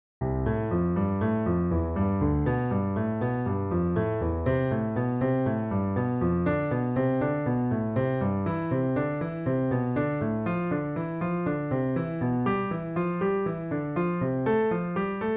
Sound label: hanon exercise, piano; Charles-Louis Hanon (1819–1900)